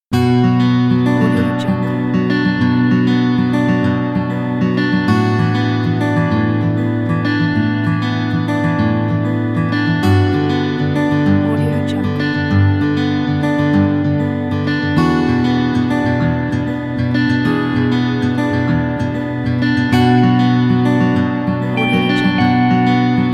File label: emotional guitars